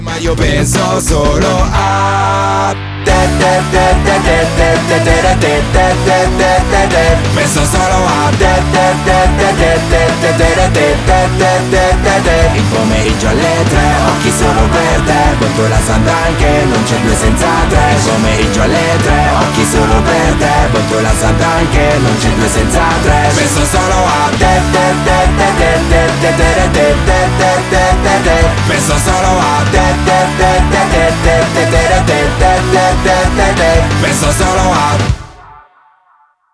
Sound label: Jingle